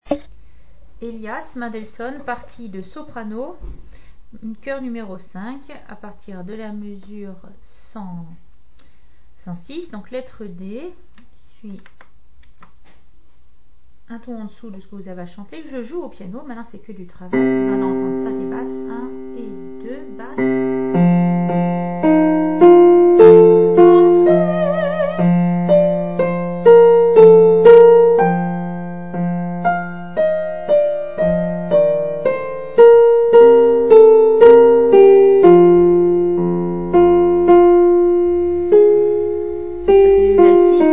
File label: piano